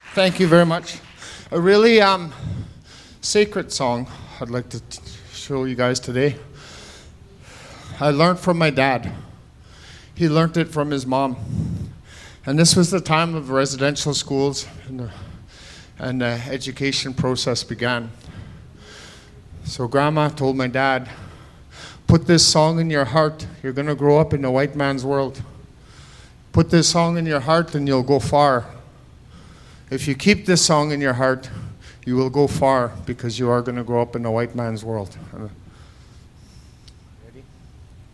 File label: Sacred Song